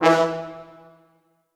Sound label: E2 R, Orchestral Brass; Best Service ProSamples vol.20; AKAI